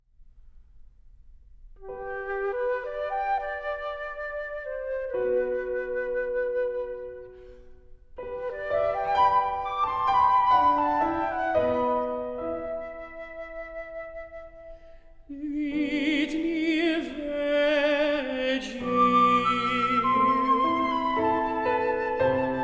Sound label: Summer Evening Flute